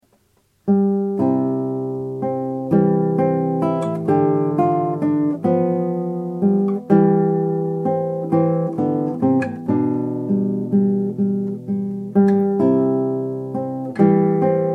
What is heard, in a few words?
op31-1 c-dur NORMAL JUST; sor